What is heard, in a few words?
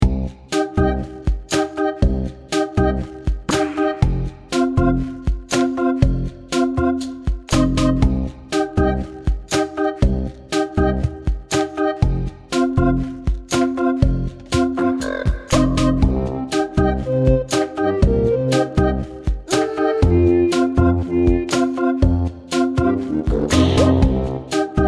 Kids Background; Kahoot; Android, iOS, mobile